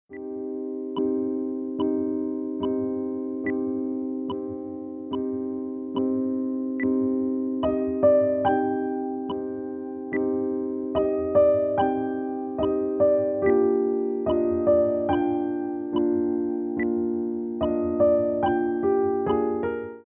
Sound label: PIANO